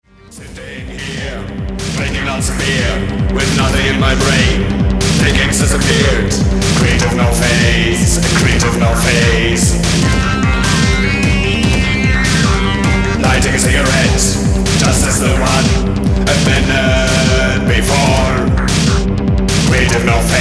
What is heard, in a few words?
creative no phase